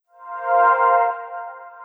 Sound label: multimedia menu startup, Nokia phone; Nokia N95